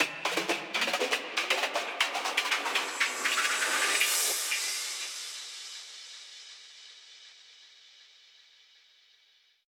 House (Riser); Rhythm Doctor

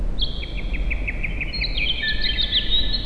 Wood

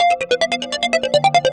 Shuffle; Audiosurf 2

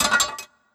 Grenadier Metal

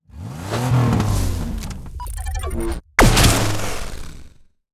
Precise Snipe

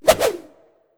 Ninja Attack Double

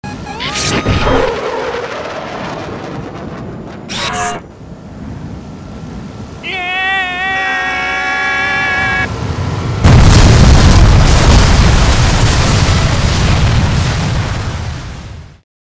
Airship

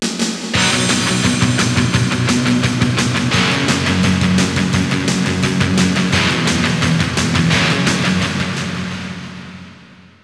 Str G#

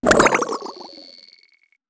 Death

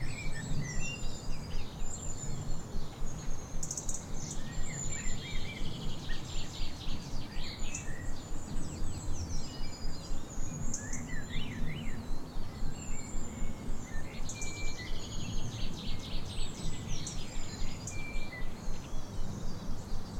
Stonehenge Amb Loop

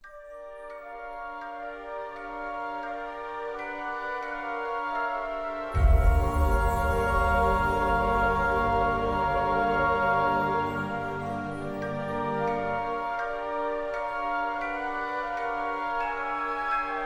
forest cursed music

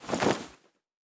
Inventory Close

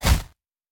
Hitting Long

